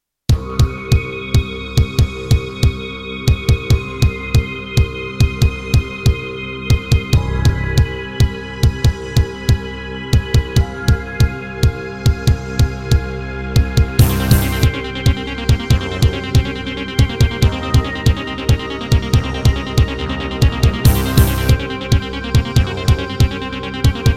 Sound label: MidiPlus MiniEngine USB; sigil doom episode 5